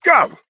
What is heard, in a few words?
pain big